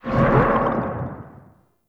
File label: Cloud Augment Enter; Warframe; Monkey King